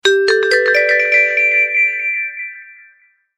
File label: notification tone